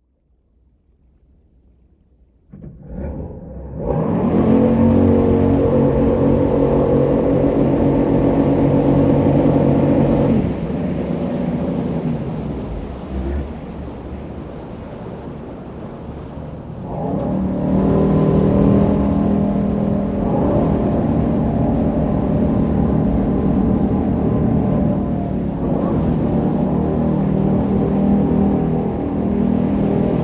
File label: Military Pbr: Onboard Acceleration With Engine Covers Open. Some Water Splashing. Dual